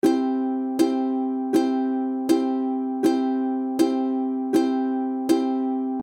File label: ukulele strumming d; ukulele schlagmuster anfaenger lernen